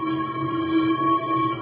Enchant Loading

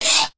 Slide; PDragon

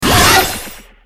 Transform Start; Dinosaur

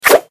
brandish sword; Chili

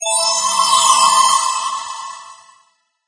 reward normal; Relic

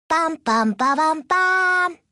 Jingle; Poison Mushroom; Ovenbreak

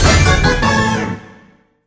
Popup magic candy success

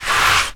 Transform Slide; Kiwi

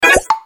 Lose Seed; Avocado Pet

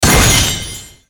Magic; Cinnamon

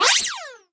Alchemist Throwing

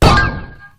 Letter Explosion; Candyfloss

